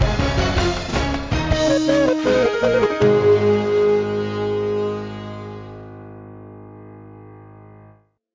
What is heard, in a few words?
Arabic Newscast Intro